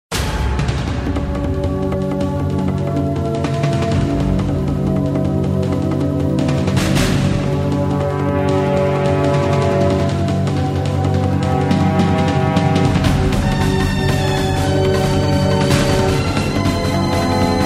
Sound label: hard fast; NBC Nightly News